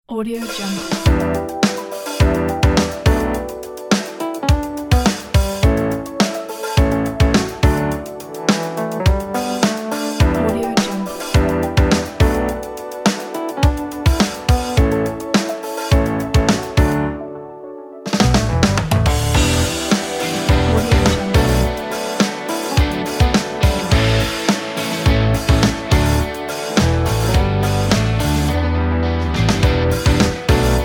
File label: europop rythmic